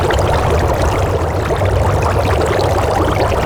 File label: Fishing Positional Bubbles; Duviri Maw Fishing